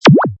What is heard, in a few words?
Wippa, Button sound; Multimedia